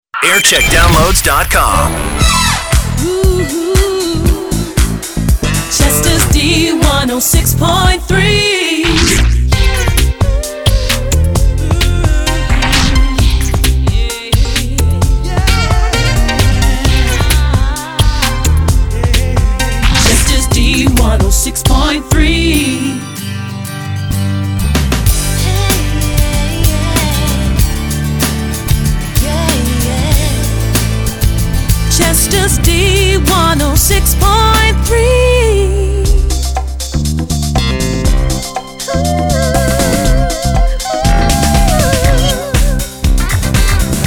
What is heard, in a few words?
jingle montage; dee 1063